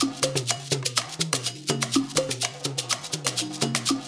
percussion loop

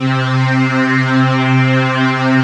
Prophet Five

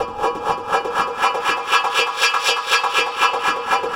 Echo Chuff, Synthetic Pulses

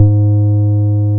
BAS MKS-50; Best Service Gigapack 2

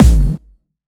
Distortion Kicks, Bassdrums

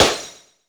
GLASS CRASH

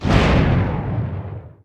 Thunder; mt-32

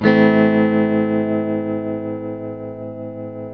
TELE CHORDS MIN A#, Guitars; Spectrasonics; Hans Zimmer Guitars VOL-1

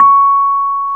PNO, Electrics, Rhodes

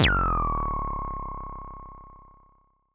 Perc Anime Laser, Vermona Mono15 Drums

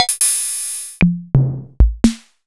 EKO Ritmo 20; Sliced

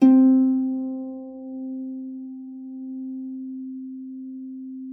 HARP B3; Xsample VOL-8; HARP P+MF

